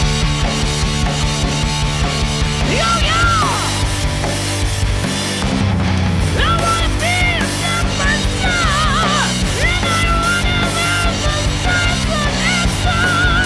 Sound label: Punk; Music Delta